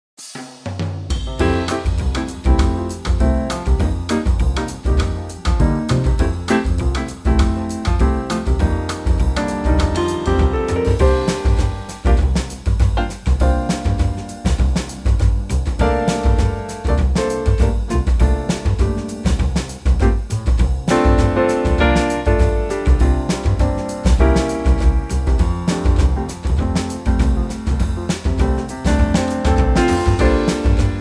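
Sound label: F, backing track; key - F - vocal range - C to D; Superb latin arrangement of this classic standard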